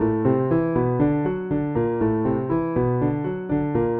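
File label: Jazz Keys Acoustic Piano 120 bpm Riff A; B-line n Riff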